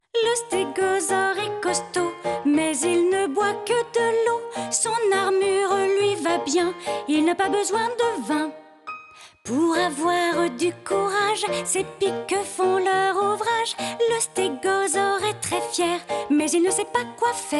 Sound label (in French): CHANSON; RAVENSBURGER; STEGOSAURE